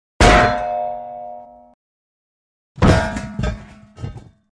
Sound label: Metal Object Drops with Long Ring Out, Construction; Hollywood Edge Foley Sound Library